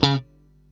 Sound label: C#3, funky guitar